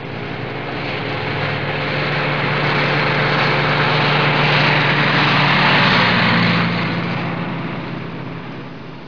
trucks

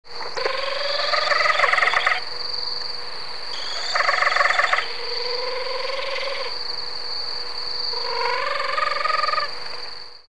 ninox connivens

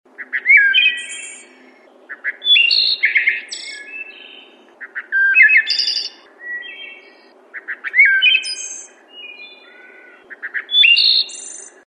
wood thrush

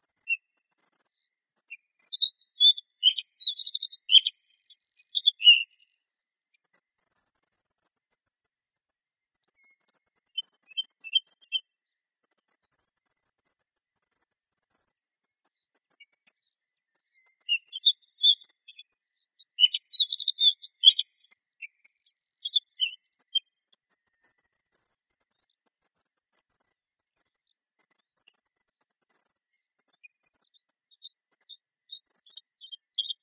bluethroat

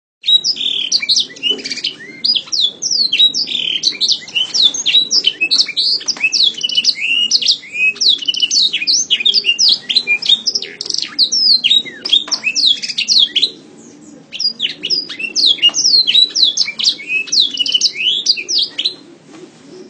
yorkshire rasa blacktrhoat

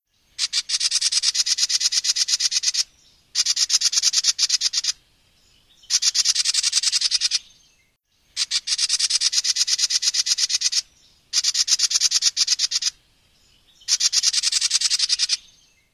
housewren

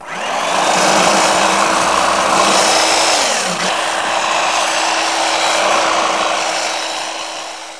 tools

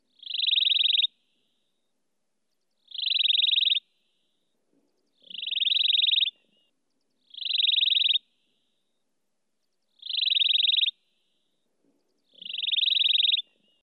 greybacked cisticola

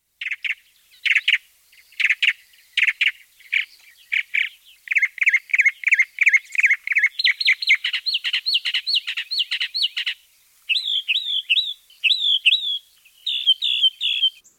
mockingbird

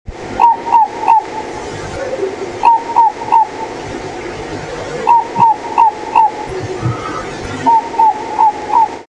ninox natalis

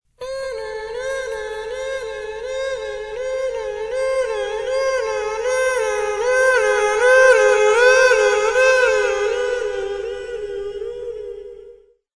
human ambulance

